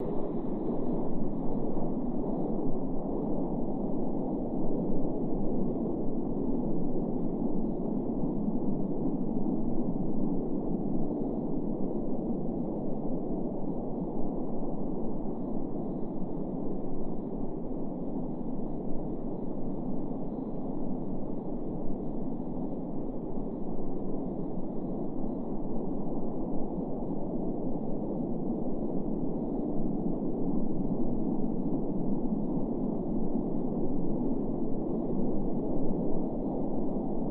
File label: arctic wind